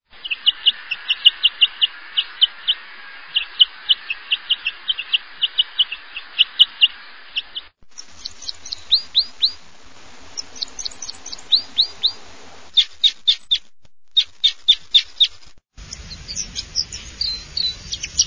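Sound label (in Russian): klest-elovik, red crossbill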